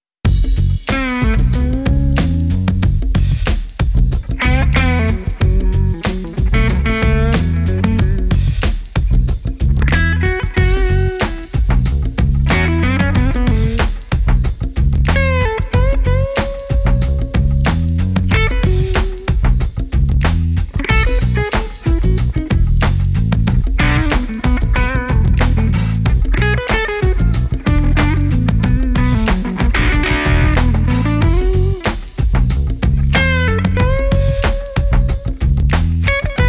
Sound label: Myka Parlor thru MKI amp